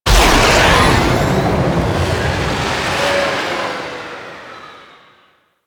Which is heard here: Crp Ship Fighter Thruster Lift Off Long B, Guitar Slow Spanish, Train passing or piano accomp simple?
Crp Ship Fighter Thruster Lift Off Long B